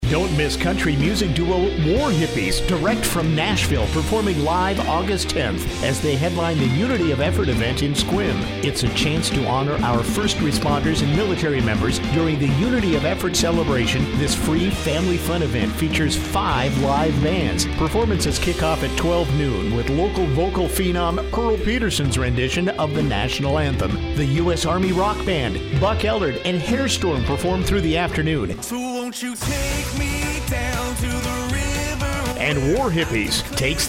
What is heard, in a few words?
Live Music Featured Mix; Unity of Effort